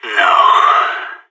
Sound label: ct death, sas; agents